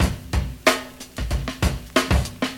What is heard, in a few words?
93 bpm breakbeat D key